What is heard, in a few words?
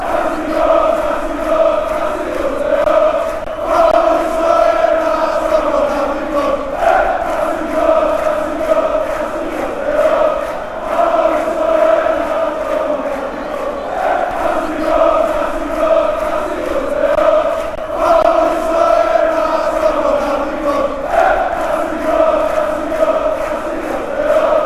fanchants, greece